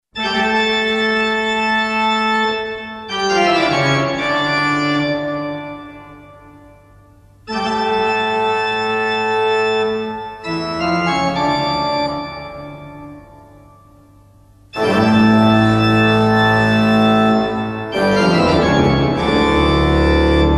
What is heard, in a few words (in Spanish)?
tono toccata; bach